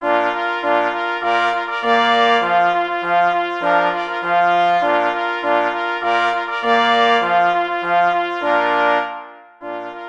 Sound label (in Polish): Marsz na 2 plesy i 2 parforsy; J. Rosner